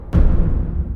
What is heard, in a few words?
confirm; sekiro